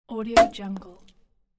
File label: champagne cork pop